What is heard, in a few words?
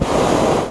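prop blast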